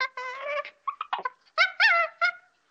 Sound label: monkey scratch; avant gardens